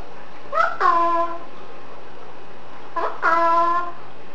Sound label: uh oh, parrot